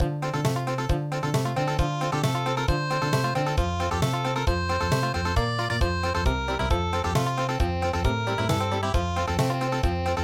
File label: Country, traditional; The Crocodile Song